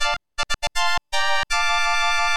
OPL3; tetris classic

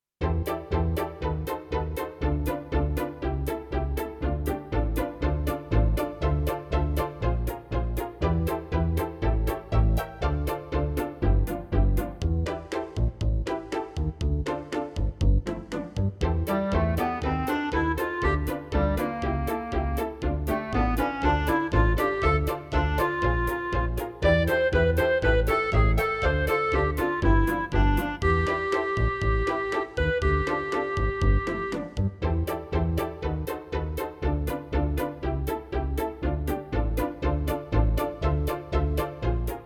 CM500 GS; LSL3

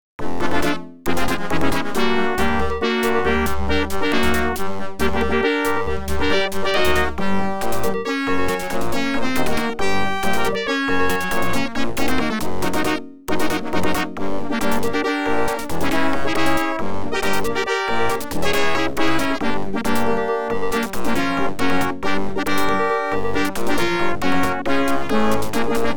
OPL2; Death Star Surface; X WING

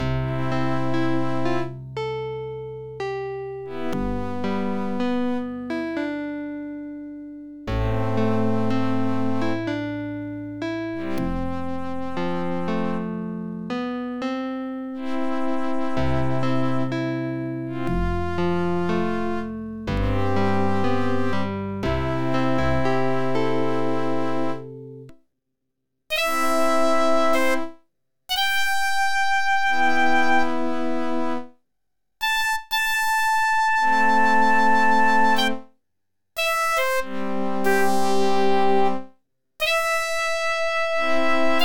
OPL3

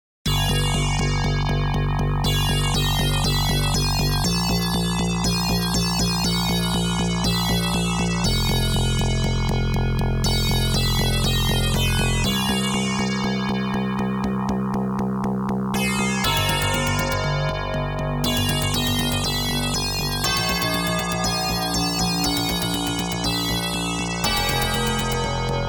OPL2

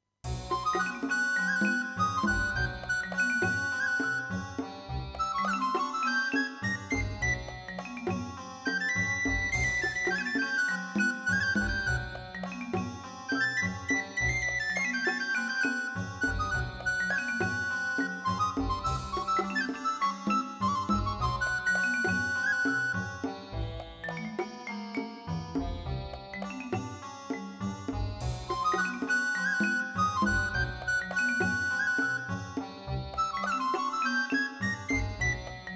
SC880; QFG3

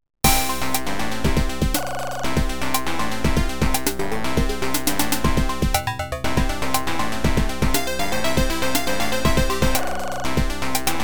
ADLIB OPL2

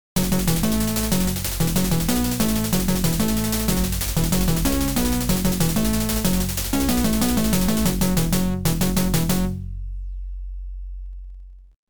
OPL2; Copilot Rescue

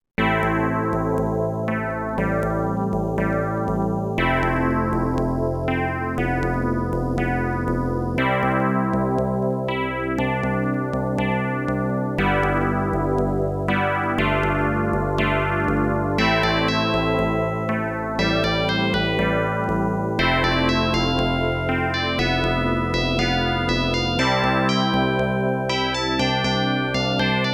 ADLIB OPL2

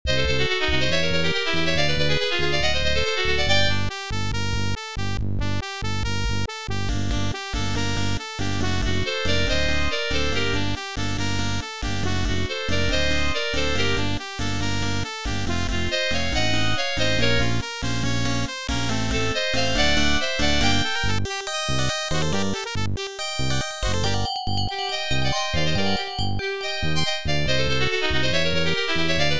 OPL3

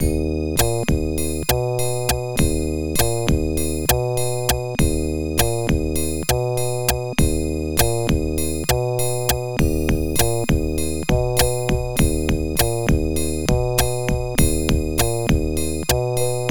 OPL3; DOOM2

